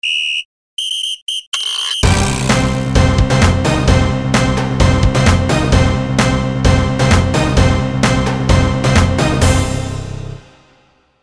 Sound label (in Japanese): ジングル; 劇団地底人公演fox-face